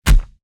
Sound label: body hit small